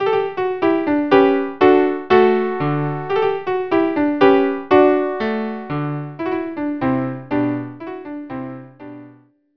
Minuet trio

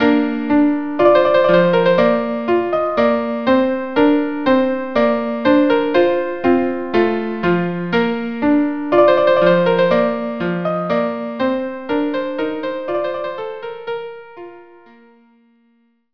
viola duo

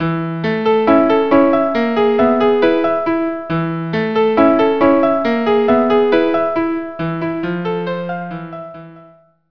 violin

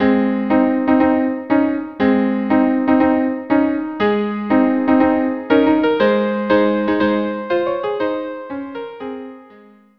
viola duo; aida

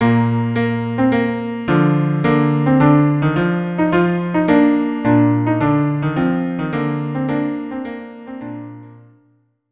cello duo; La Majeste telemann

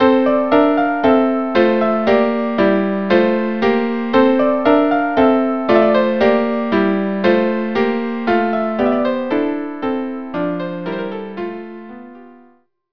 lullaby trio; grieg

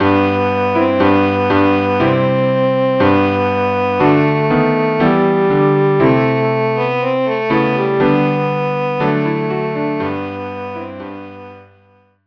chorale cello piano; cello and piano or organ; st anthony

